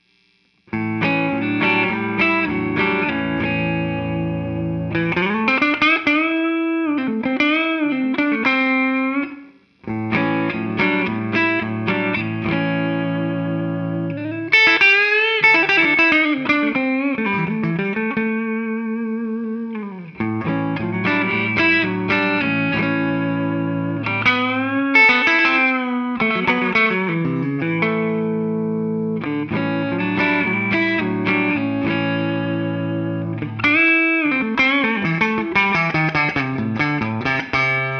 LP Neck Clean; Les Paul Neck Clean

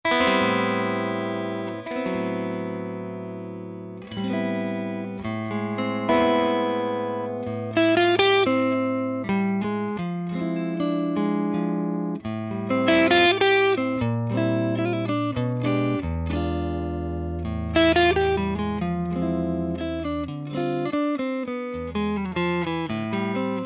Clean Strat; single channel Tweed Bandmaster design; MVAmps MasterTone; 28 Watts

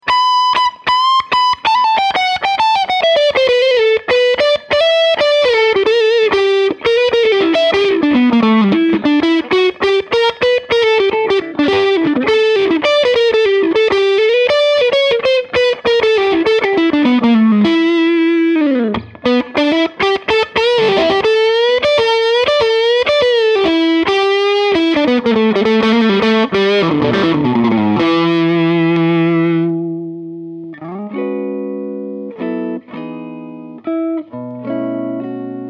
Gibson 335; Modified Trainwreck design; 4 El84 Output tubes; Mercury Magnetics; 28 Watts

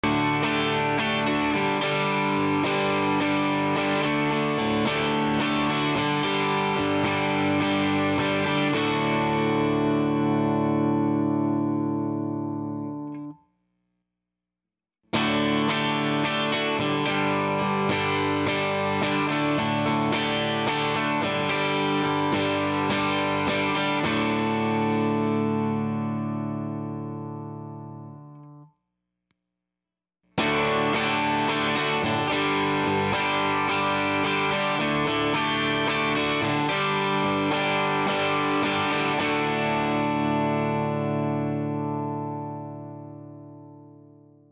Rhythm Tele

